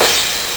TR707, Nintendo Gameboy LSDJ

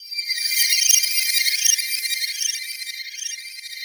ELVES, PIXIES; Spectrasonic Distorted Reality 1

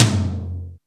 TOM ROM; Back In Time Records; Big Bang

